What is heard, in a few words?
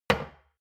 shot glass place table medium; berufe; gastronomie; soundpool